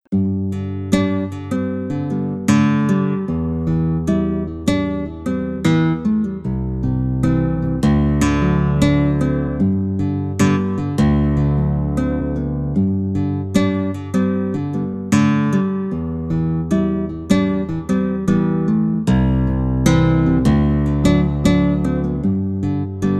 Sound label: hawaiian guitar, 432 Hz